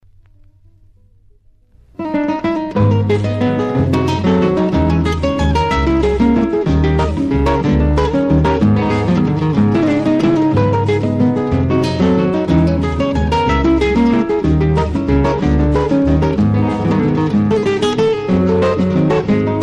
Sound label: Vals Criollo